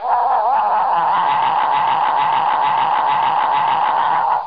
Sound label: globe; attic